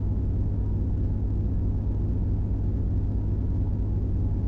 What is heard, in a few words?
deep Gen Hum; machines mechanical